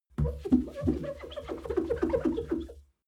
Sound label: clean window paper towel rub